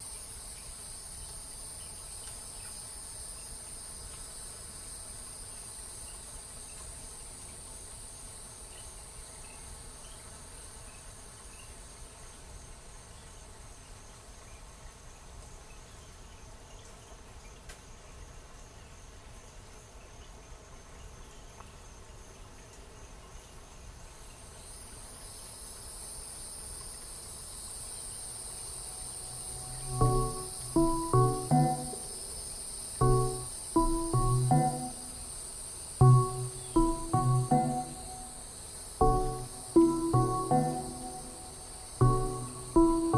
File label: dozing in cicadas; mintsyrup; bugdaughter